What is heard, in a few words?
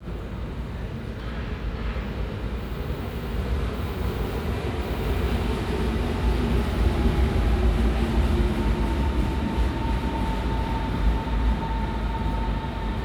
S Bahn Berlin; Sound Scapes